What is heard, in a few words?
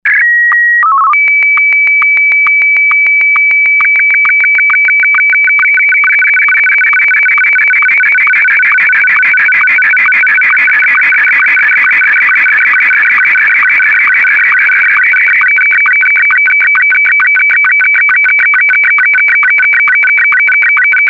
sstv analog m1